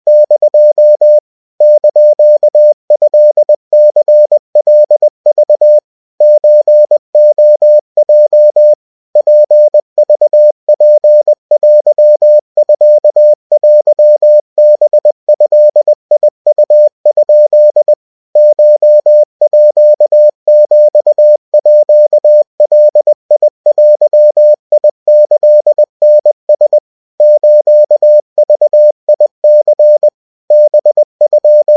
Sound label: Japanese 70cpm